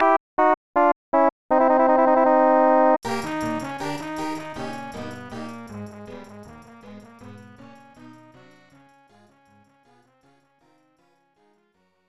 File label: Casino Lose; lufia 2; snes